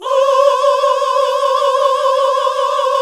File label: GOSP. AAAHH; Sound & Vision - Gigapack II CD 1 (Roland); HAL Gosp. Aaah 2